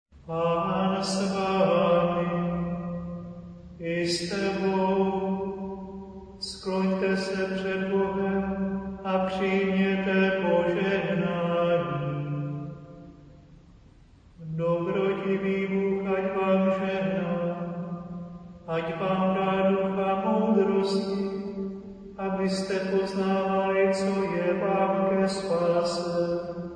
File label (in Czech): pozehnani choral